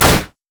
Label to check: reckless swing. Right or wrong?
Right